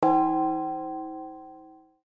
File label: chime; maoudamashii